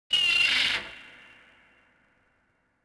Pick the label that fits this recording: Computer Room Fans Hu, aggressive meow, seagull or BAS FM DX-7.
seagull